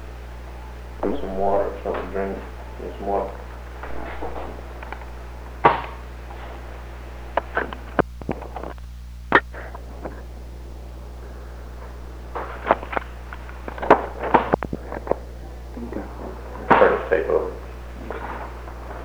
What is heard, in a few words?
tape handling noises; Turn the tape over segment with tape handling noises and alt